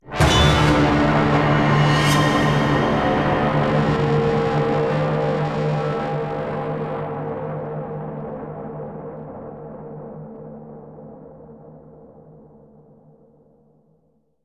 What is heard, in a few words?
Menu Mission fail